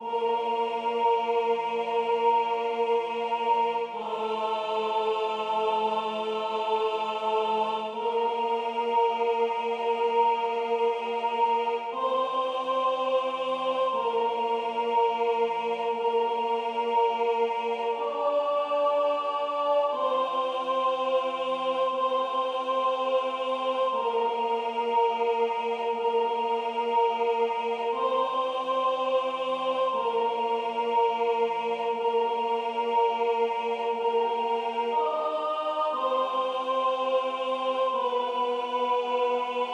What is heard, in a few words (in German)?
Gesang Stimme